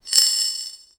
stone magic roll

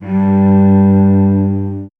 cello playing a

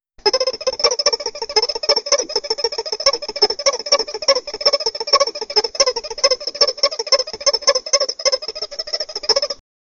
sound effect, animation

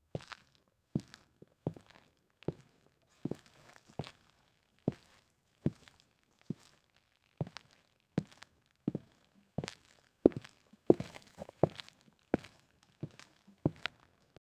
steps with boots

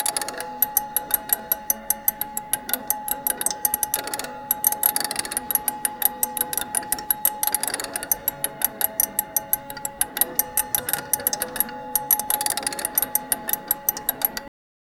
a steampunk style music, clicking

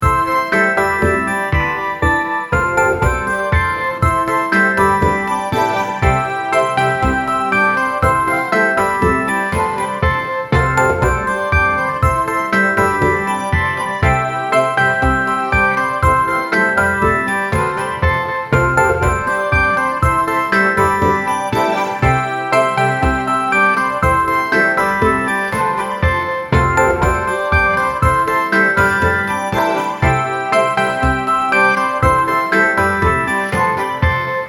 christmas dance

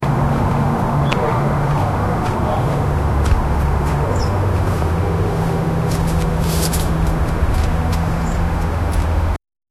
asmr soft footsteps on; neighborhood

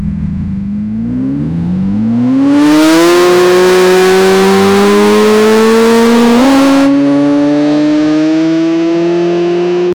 the sound of a, race car

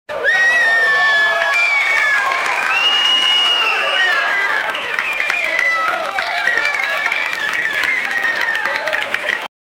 create ambient club noise

